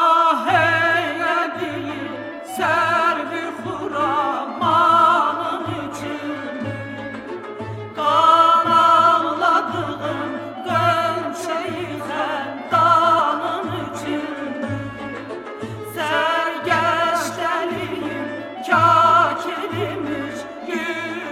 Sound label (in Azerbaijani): duet leyli medjnun; fizuli